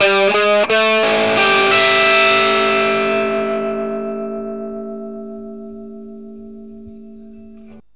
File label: PICK, Vintage Blues Guitar; USB Soundscan vol.22; AKAI